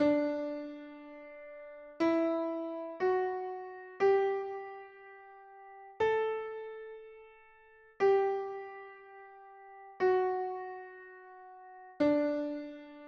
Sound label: modal desert minor hijaz ish flavor